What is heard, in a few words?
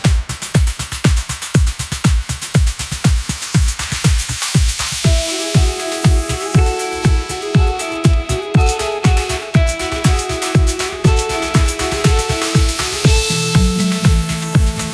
fingerstyle pop; ai-generated